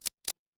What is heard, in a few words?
mechanical equip